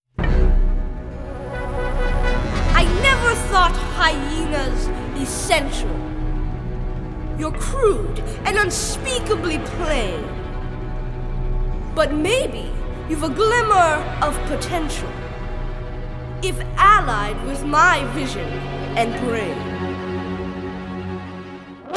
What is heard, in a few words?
Audition Vocals; audition materials; Disney's the Lion King Kids